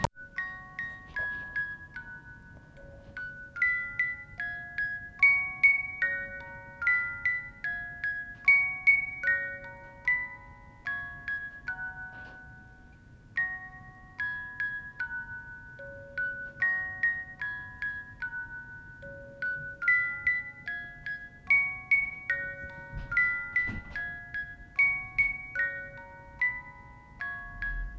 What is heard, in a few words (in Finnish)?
Soittorasian melodia